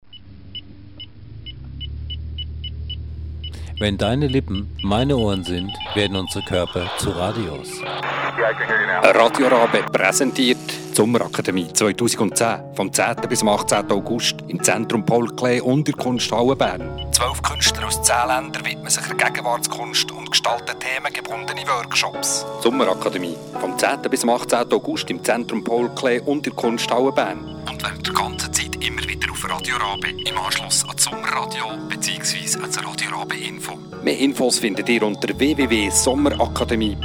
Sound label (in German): Jingle; Sommerakademie